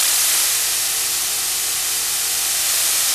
columns IR Steady State; Dynamic DWM

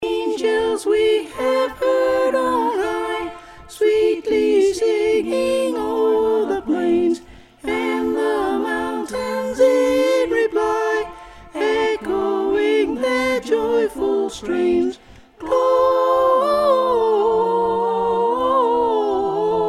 Soprano; Choir Harmonix; Harmonies; Carols; Angels We Have Heard On High

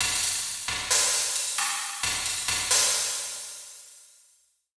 drum kit; Diffusion; Processed

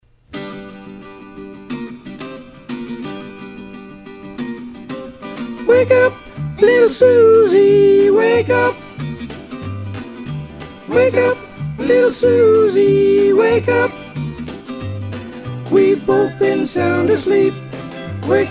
Soprano; Choir Harmonix; Harmonies

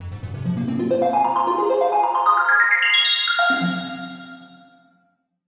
synth; Diffusion; Processed

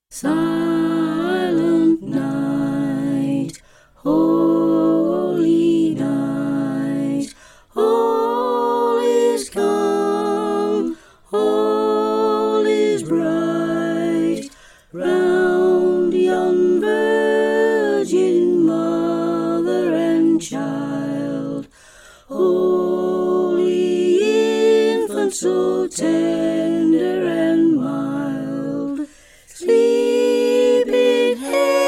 Soprano; Choir Harmonix; Harmonies; Carols; Silent Night

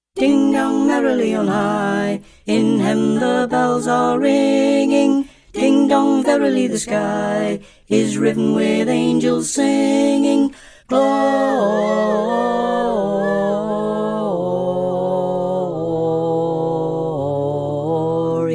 Alto; Choir Harmonix; Harmonies; Carols; Ding Dong Merrily On High

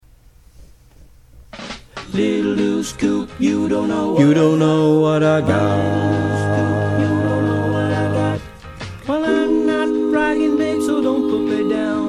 Bass; Choir Harmonix; Harmonies

